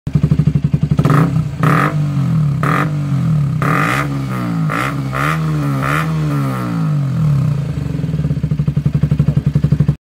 RC exhaust

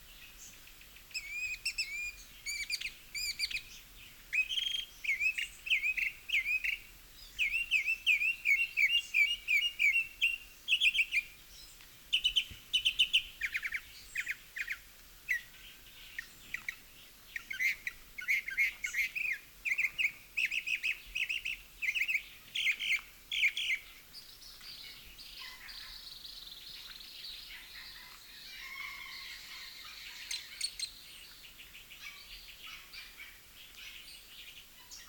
Northern mockingbird

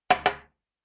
Put glass on table; گذاشتن لیوان روی میز

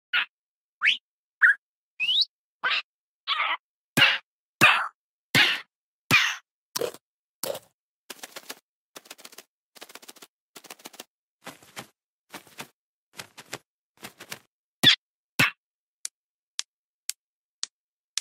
Parrot; Minecraft; ماینکرافت

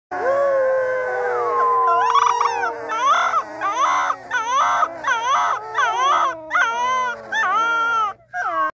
Basset Hound; سگ باست هوند

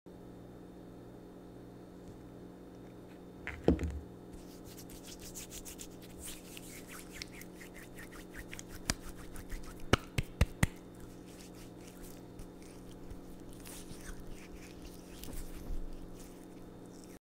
hand cream; کرم دست

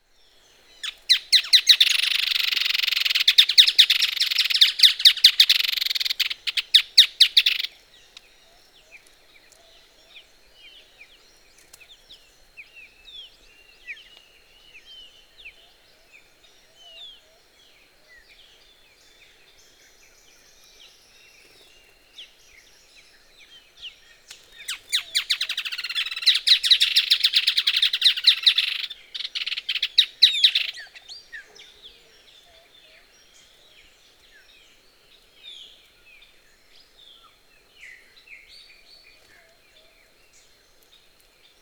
Striated softtail

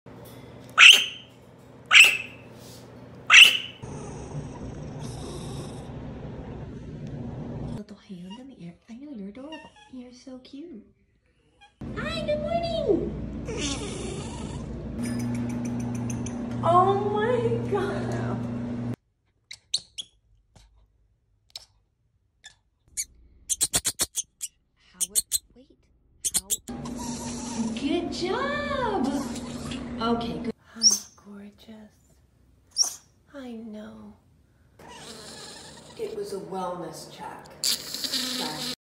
Kinkajou

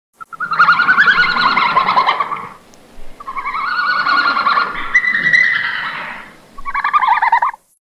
Red panda; پاندای سرخ

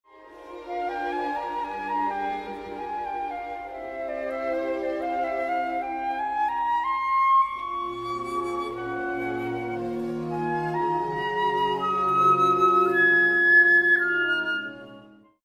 keyed flute; فلوت کلید دار

